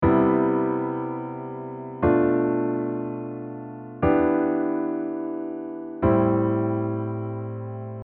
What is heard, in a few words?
diatonic prog